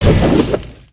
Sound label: Fire Punch; Dragon